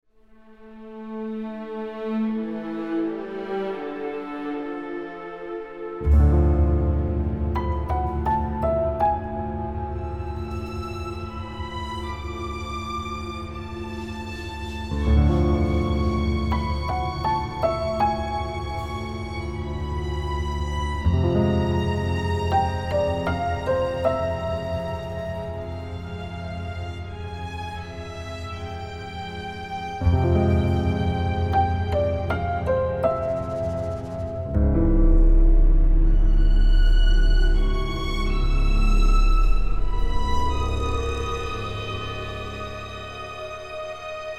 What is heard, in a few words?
Suspense, film scores